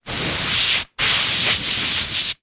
industrial compressed air aerosol spray bursts x2 against object; zapsplat